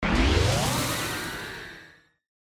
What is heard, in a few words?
Ascend Burst